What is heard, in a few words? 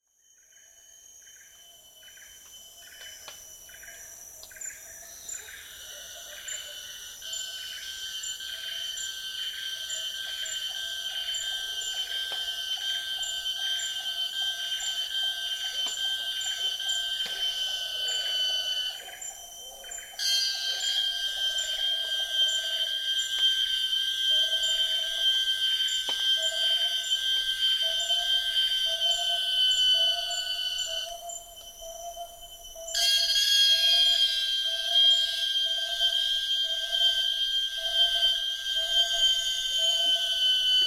Paleotropics theme sound, soundscape chorus